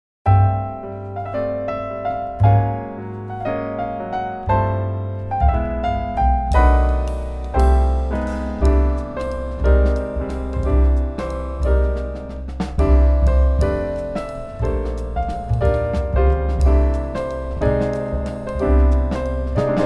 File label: Waltz